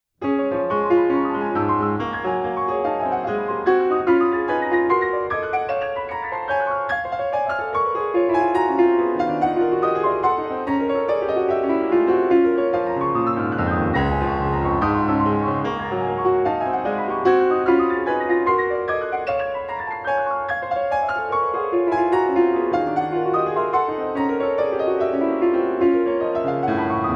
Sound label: waltz